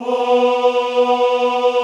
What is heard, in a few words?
OHH B2, Classical Choir; Best Service; Extended Classical Choir